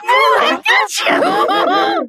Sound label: Hit; devour 2021 gamerip pc macos